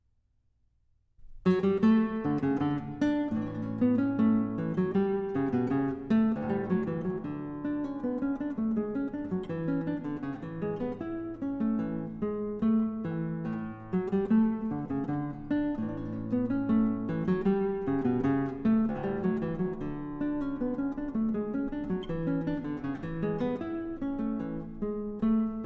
Suite for solo cello in C major Bourre

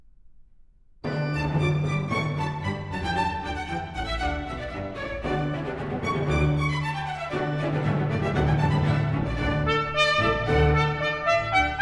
Sinfonia for trumpet, strings & continuo, Allegro

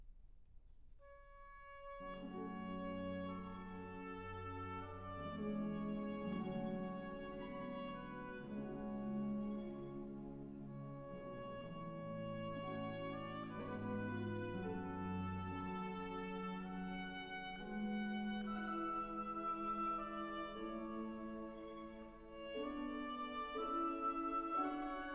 suite for orchestra; 2. the Dove; Respighi

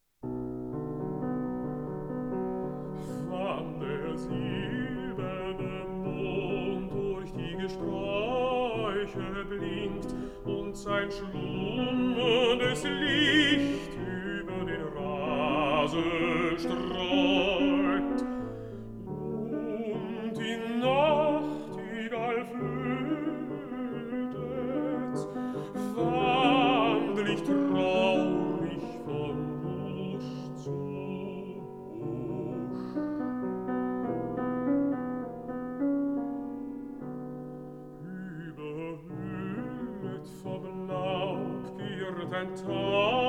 for voice & piano

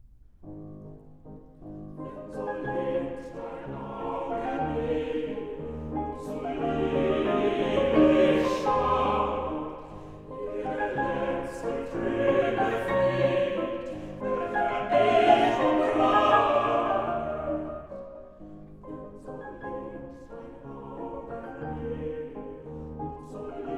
Waltzes for vocal quartet & piano, 4 hands; Liebeslieder Waltzes (18); Brahms

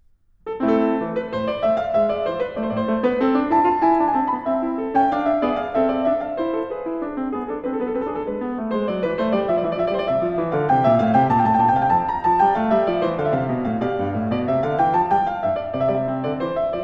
English Suite in A Minor, Courante; English Suite No. 2; BWV 807; Bach

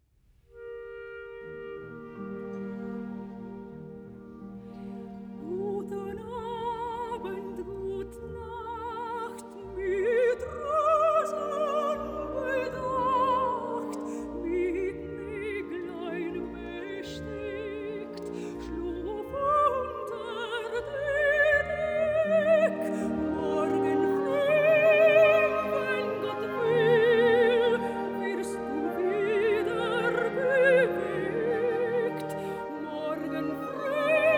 Wiegenlied, song for voice & piano; (＂Guten Abend, gut Nacht＂)

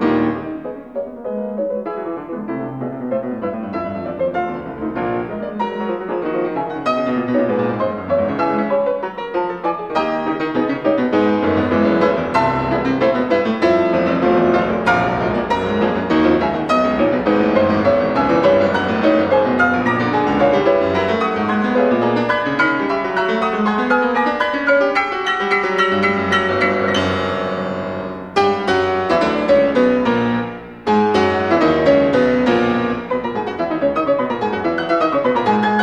Sonata for Piano in B minor, Presto, Prestissimo; Debut Recital

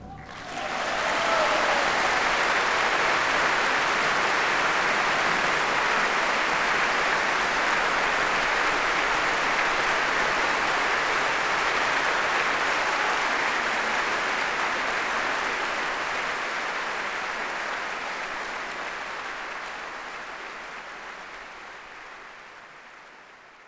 Applause; Dvorak; Requiem; Symphony No 8

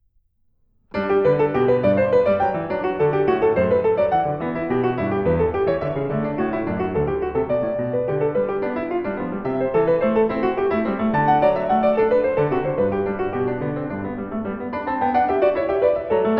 Variations, for keyboard

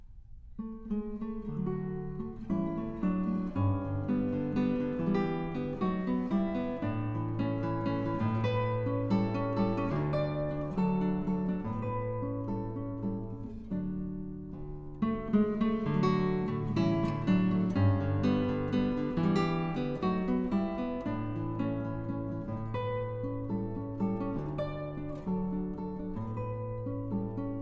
for piano Walzer Trauerwalzer

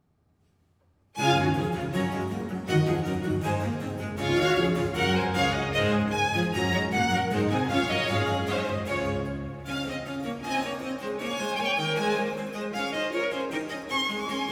Concerto Grosso in D major; Corelli

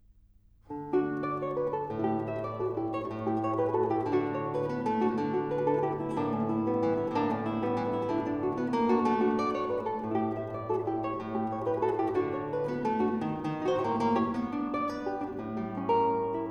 Venezuelan Waltzes for guitar, in D; Music from the Novels of Louis de Bernières

